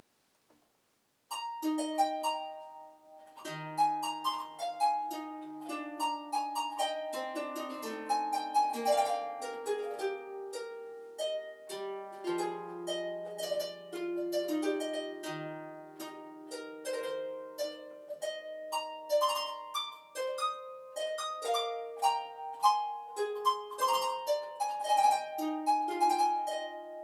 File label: harpsichord